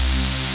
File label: TRANS X BASS, Synthsonic; Pro-Rec - Synthsonic; BASSES